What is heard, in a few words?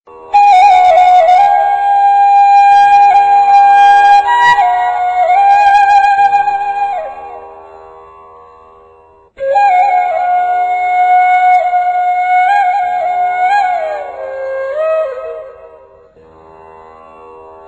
krishna flute